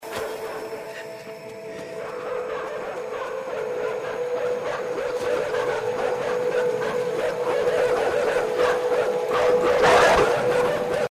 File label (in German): hundebellen; inside